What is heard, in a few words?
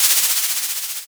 pen